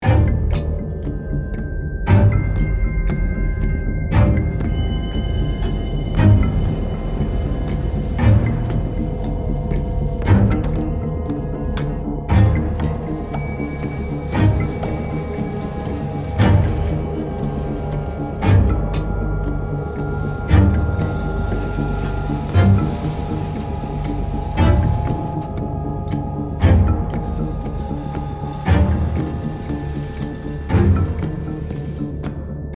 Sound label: Action Loop